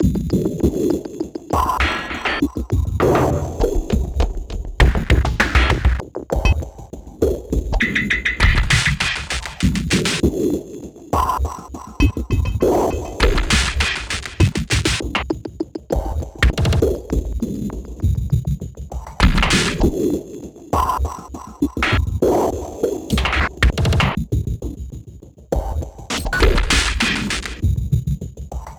Small Fight; sky break

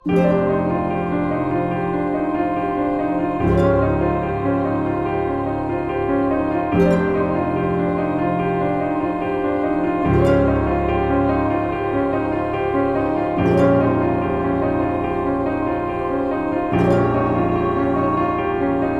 Week Dungeon Waiting Intro

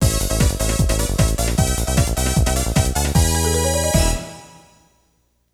Battle Mode Challenge Victory Tune

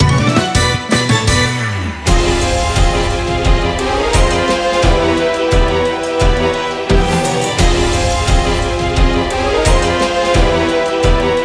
Music event

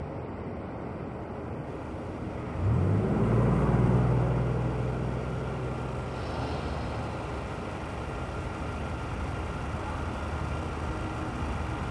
city night lo lp; The Sims 3; windows gamerip 2009

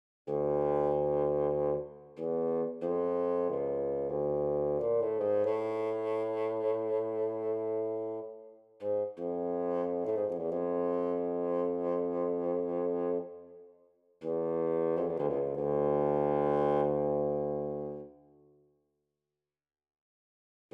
Bassoon Melody A 94bpm pickup

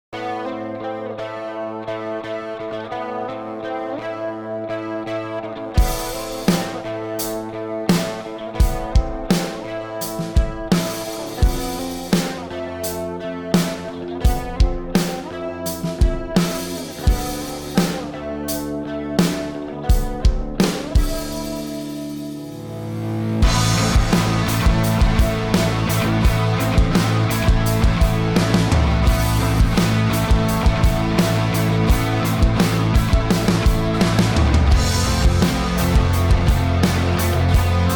Rock; Sons of the Forest

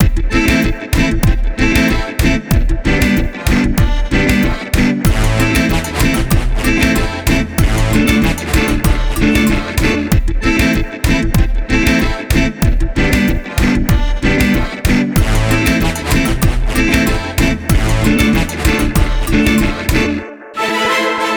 Epic Reggae

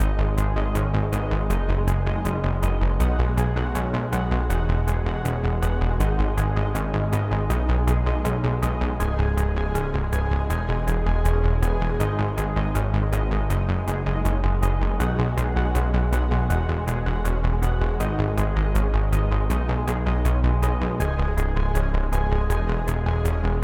Music Ending 160bpm; Sockventure

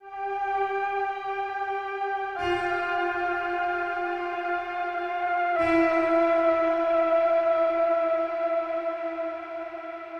Overlay Tone; Benefactor; Mirror's Edge Catalyst; PS4, Windows, Xbox One

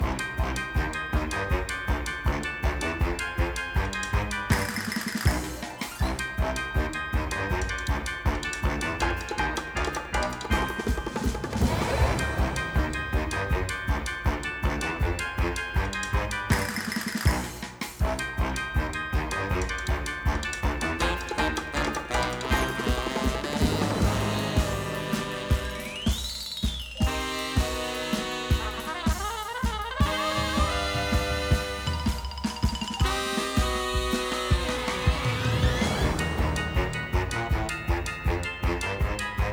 Nightmare Groove

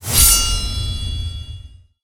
UI Level Up

